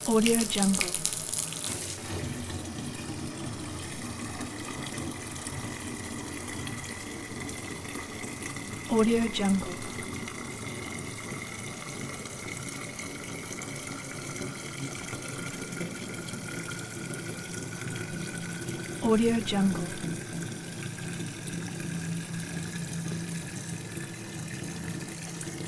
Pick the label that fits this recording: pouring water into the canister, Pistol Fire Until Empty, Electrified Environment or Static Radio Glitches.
pouring water into the canister